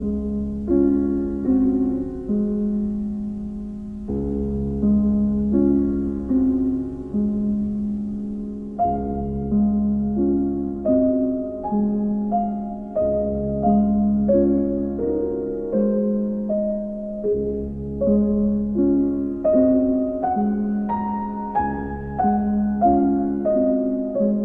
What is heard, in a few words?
piano, Berceuse In D Flat major; Garrick Ohlsson; Opus 57